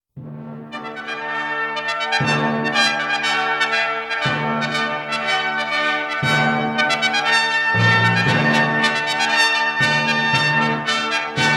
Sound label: trumpet intro